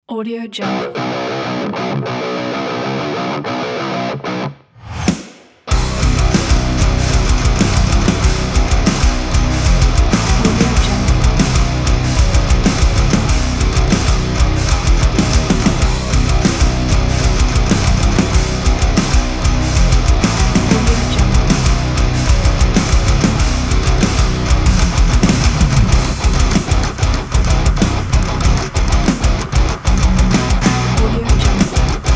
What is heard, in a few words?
a metal